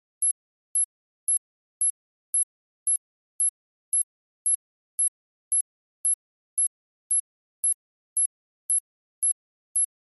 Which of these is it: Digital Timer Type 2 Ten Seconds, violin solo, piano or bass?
Digital Timer Type 2 Ten Seconds